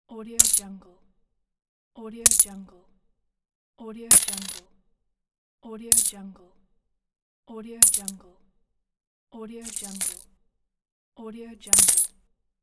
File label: coins